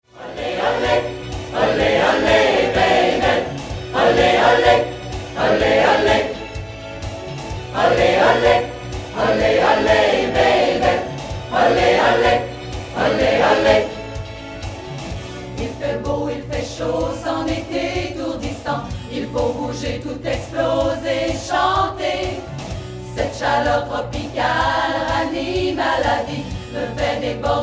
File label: Ole ole SATB